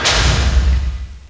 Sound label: bash; duke nukem total meltdown